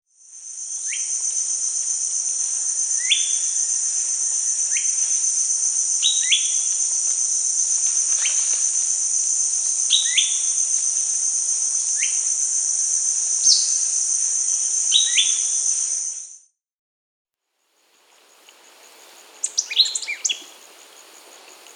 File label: White-lored Euphonia (Euphonia chrysopasta), Peru, Birds of the Amazon; S. America